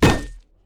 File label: yeti vs metal; nunu